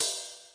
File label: RIDE Feather, Roland TD 7; drum machines